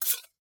Take Knife; Game rip; MiSide

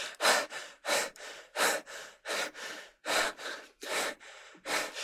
Player Run Mouth; MiSide

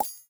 Money; MiSide

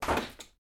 Scarf Close; Game rip; MiSide

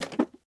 Stool Jump; MiSide

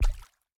Maneken Step Soft Water; Game rip; MiSide

